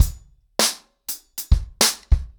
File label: 100BPM, ACOUSTIC DRUMS